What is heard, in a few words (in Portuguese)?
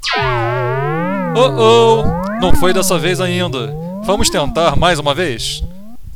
erro; PGTIAE 2011 - Ritmos Musicais